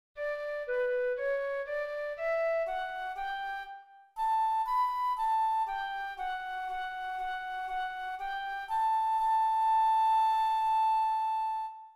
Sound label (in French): Flute